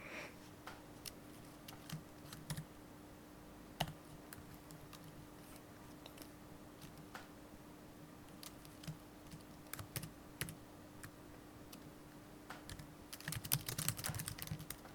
Keyboard Typing In Office

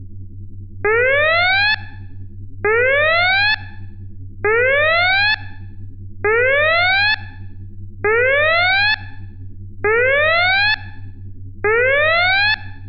Emergency Simulation; Star Trek

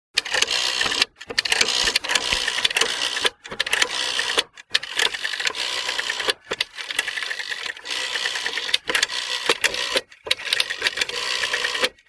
Old Phone Number Dial Turning

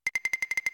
Cash

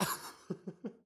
Male Laughing

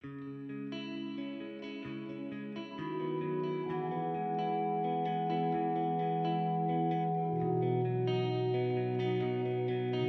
Nostalgic Harmony

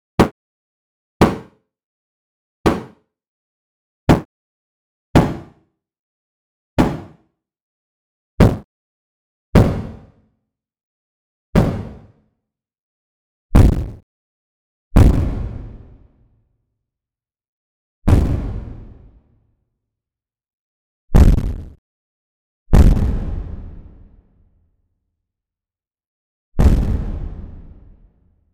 Bangs Scratch Built In Audacity

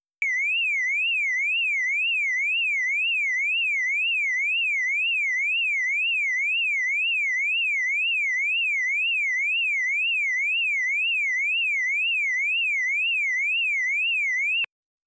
Car Alarm

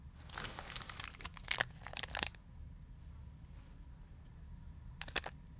Condom Wrapper Rustle

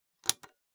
Button

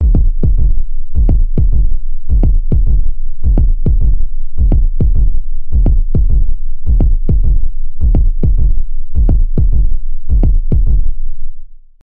Heartbeat

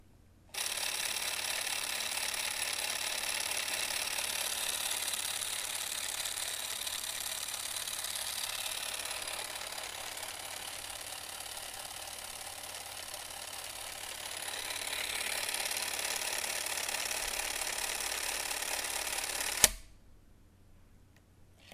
Super8 Pan Left Right

